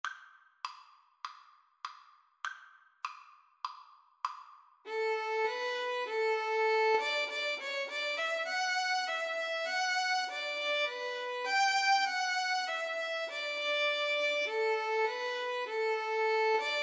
violin duet

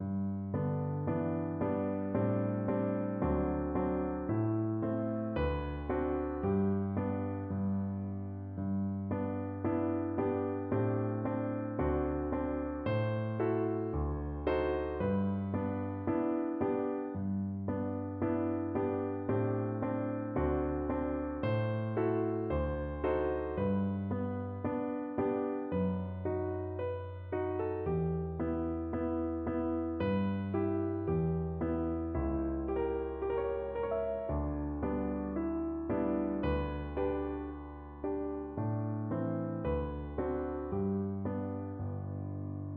love you, piano

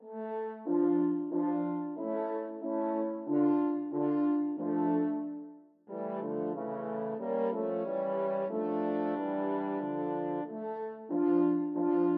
go down moses, french horn trio

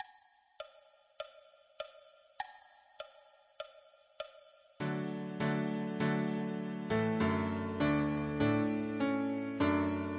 viola

